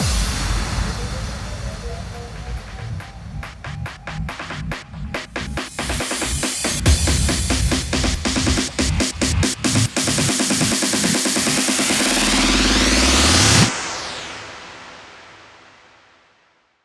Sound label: 140BPM 8Bars, HandsUp & Trance Fills; AlienStar; Vengeance Samples